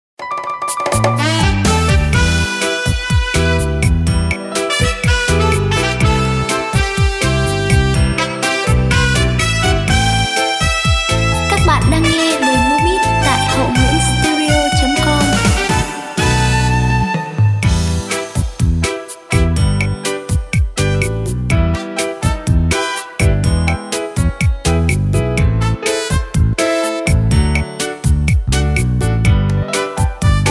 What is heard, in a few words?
beat, playback